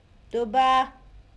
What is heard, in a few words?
pig; Duobaa; ghana; lawra